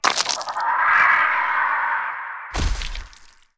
Honeyflower Death

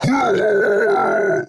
Mudman Death

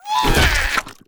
SafetyMole Attack

